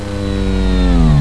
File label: flaps down, aircraft